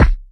Kick

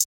Hi-Hat

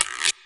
Weird Perc; Percs

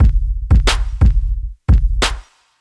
Loop

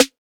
Open Snares

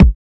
Kick

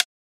Perc

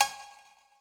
Perc

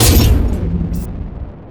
Impact

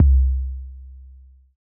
808 MID

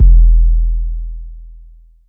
808s

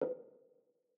Tom

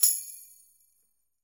Tambo; Plugg Tambo #2

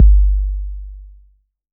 808's

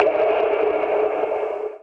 percussion cave hit

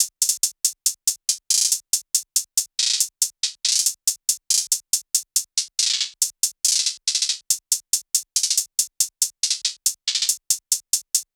beat loop hihat 140

